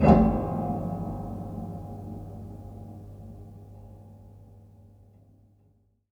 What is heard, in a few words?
brush 1shots contact, The Keyless Piano Body; Detunized